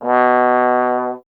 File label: Trombone B 0; Miroslav Vitous - Solo Instruments VOL-1